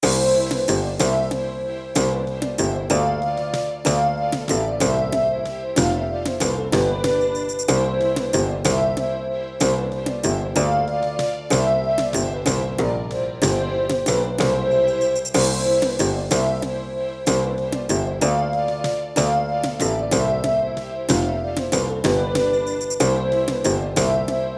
Sound Saurus; Bards Tale Badhr Kilnfest

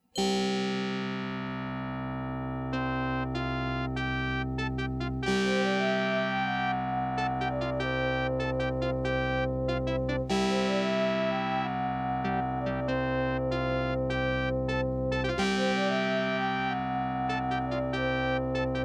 Aztec 2316A; Space Quest 3 Introduction

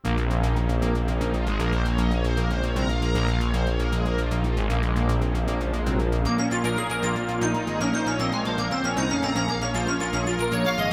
AWE64 Gold

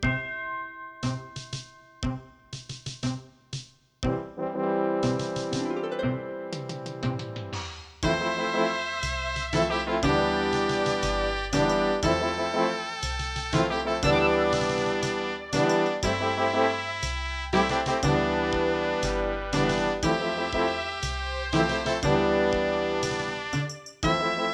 AWE64 Gold; Runescape 08

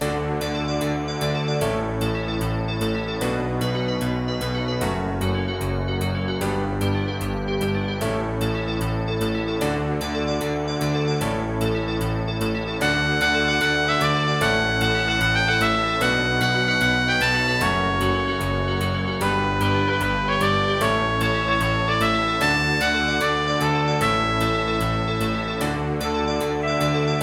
AWE64 Gold; Lemmings Level 1

